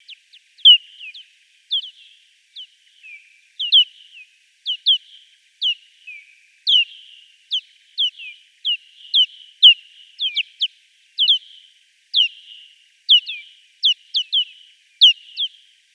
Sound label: bracken